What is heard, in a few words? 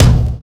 bass drum sample b key